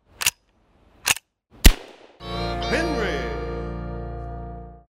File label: Lever Action Shot; Henry